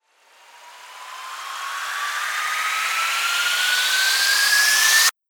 RISER

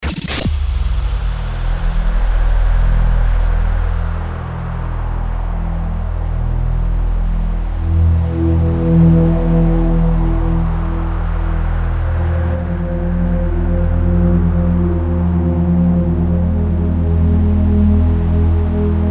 DRONE THE STORM IS COMING 125BPM E

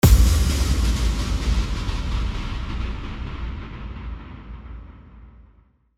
IMPACT

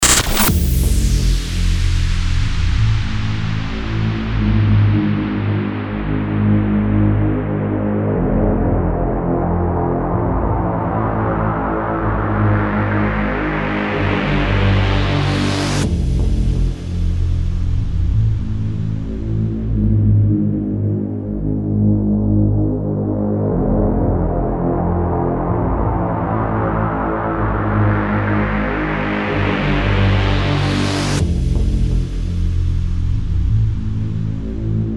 DRONE UNLEASHED 125BPM FMIN

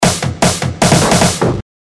SNARE ROLL TRANCE 152BPM

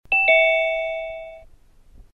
ZOOM JOIN SOUND

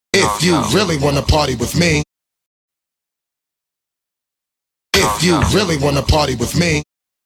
SCRATCH 100BPM

